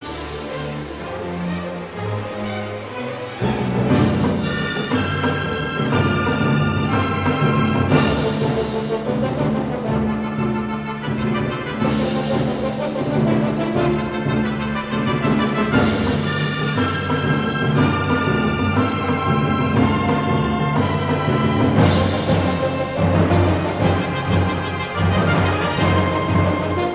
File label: Battle Scherzo; FMA